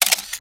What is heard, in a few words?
Camera Capture Loud; Skype Sounds